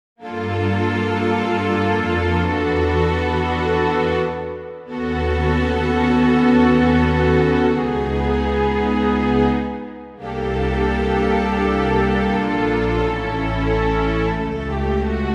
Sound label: strings